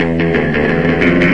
evil surf guitar; GUITAR LOOPS

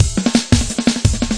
172 clangy rollout, drum loop; 161 to 180 bpm

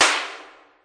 LIGHT SHOT, Roland TD 7; drum machines

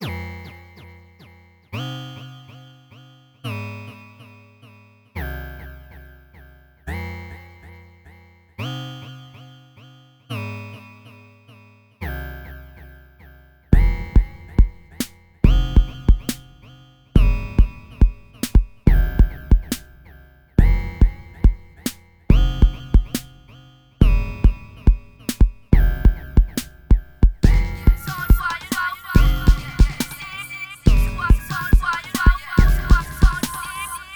moving waves

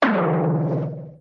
vintage flat peeong, Cartoon Sound Effects; UNUSUAL KITS and FX

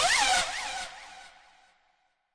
virus scream, Virus Electro Hits; UNUSUAL KITS and FX

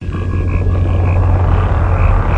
spaceship; Various Strange Sounds and Effects

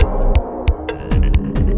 135 ugly dark loop; SYNTH AND ELECTRONIC LOOPS

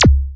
Syn Dr, Overloaded Synthetic Drums; style kits

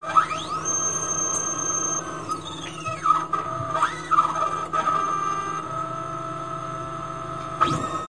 strange resonant squeal; Atmospherics

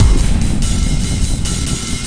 146 bpm giant tribal 3-4, drum loops and breaks; 141 to 160 bpm

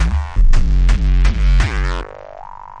170 super hard kiks, drum loops and breaks; 161 to 180 bpm

